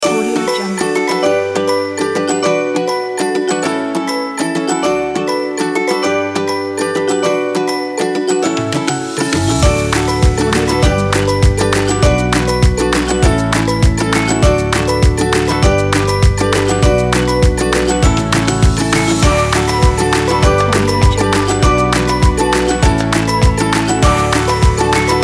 a happy

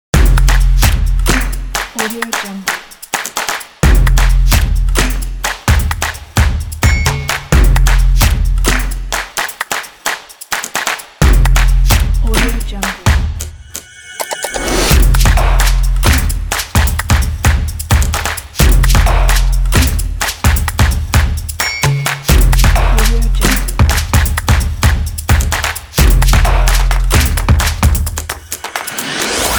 stomp

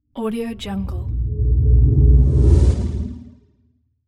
spaceship airy phased pass by

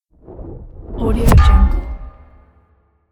whoosh heavy metallic slam impact

